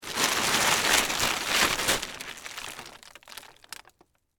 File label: Plastic Tarp Move, Foley, Movement, Uncover, Body; BlastWave